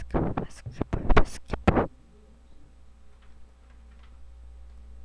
basketball

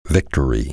victory

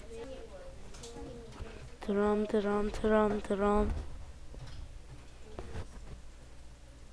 drum

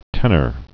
tenor

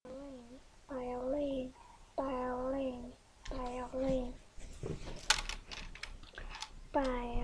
violin

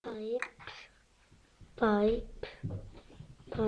pipe

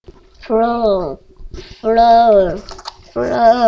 throw

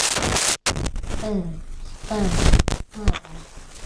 spin

